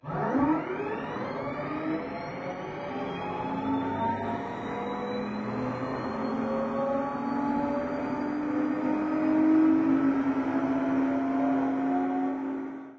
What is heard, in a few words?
turbine start interior; War Thunder